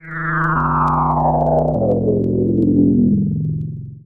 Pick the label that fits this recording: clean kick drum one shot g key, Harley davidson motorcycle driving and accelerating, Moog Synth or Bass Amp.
Moog Synth